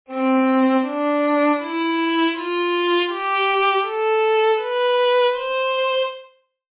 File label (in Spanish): Escala Ascendente, violin